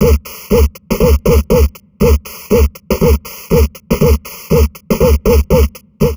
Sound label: Drum Loops Crazy Processed